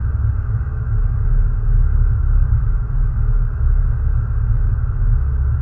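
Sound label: loop background noise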